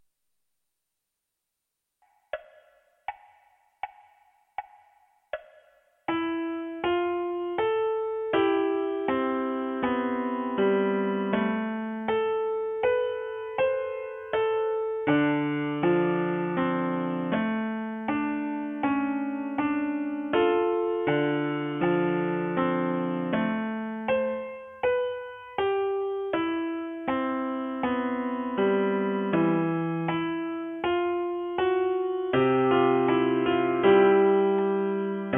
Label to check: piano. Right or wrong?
Right